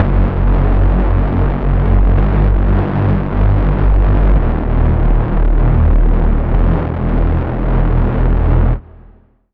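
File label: Reese, Bass; Reese - Underlaw; Sylenth1; Routed Sound; Sylenth1 Expansion - Noble Gangster Vol.1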